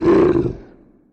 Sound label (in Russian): udar